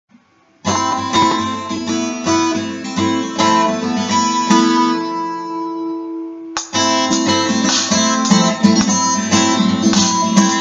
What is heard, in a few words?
107 bpm